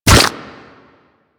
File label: Bullet Flesh, Impacts; Warframe